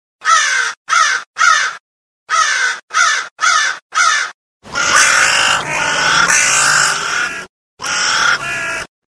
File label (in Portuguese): sons de passaros na selva